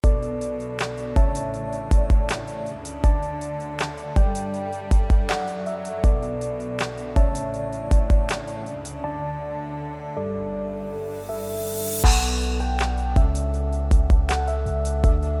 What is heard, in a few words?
peaceful, AI music